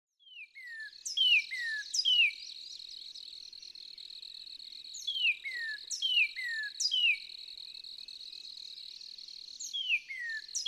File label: Northern Cardinal